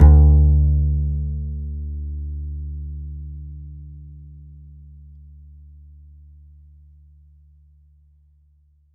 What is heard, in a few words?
D, Upright Acoustic Bass; Larry Seyer; SWITCHABLE POSITIONS